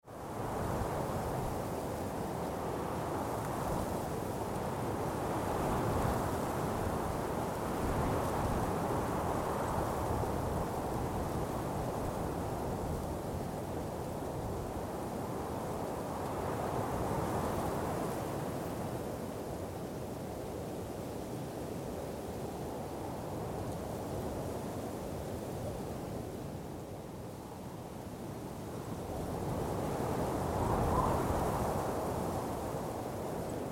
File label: Mountain peak ambience light wind blowing